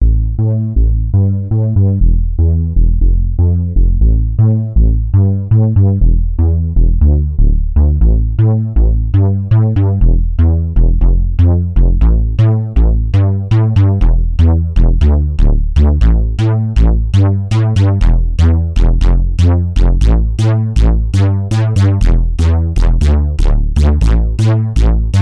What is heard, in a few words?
yamaha ys200 sounds unisono bass